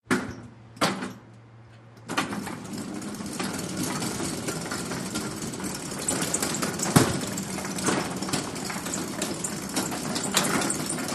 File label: HOSPITAL EQUIPMENT HOSPITAL: Gurney cart, constant run, faster.